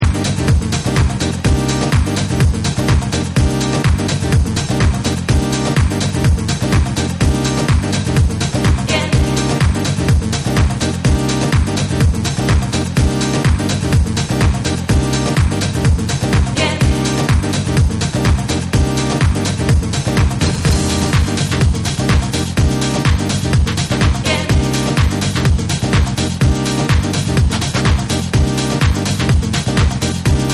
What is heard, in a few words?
Dub